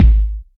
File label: verby kick one shot g sharp key